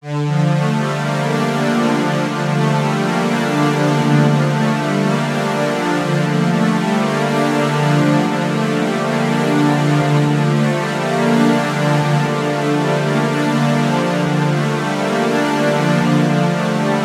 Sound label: Multi Part Pad; Waldorf Kyra